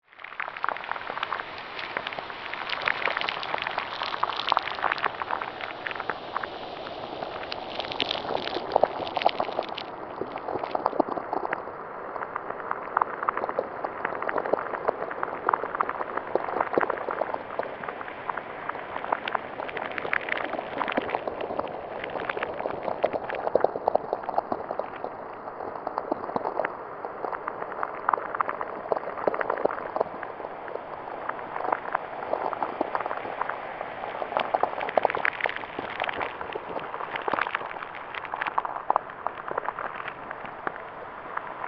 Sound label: Bed Crackling Eerie, Production, Element; Sound Design; Blast Wave